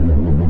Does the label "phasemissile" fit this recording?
Yes